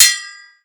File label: sword